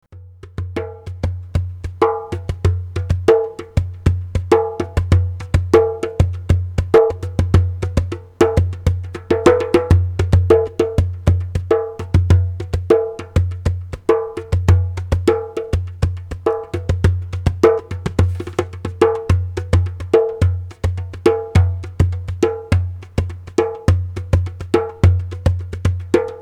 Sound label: djembe